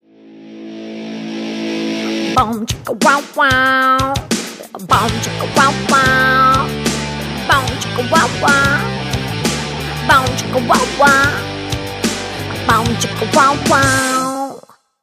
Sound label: boom chicka wah wah; ringtone; axe ad